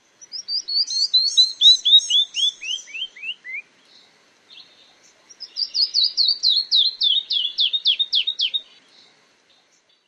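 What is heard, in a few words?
Canyon Wren song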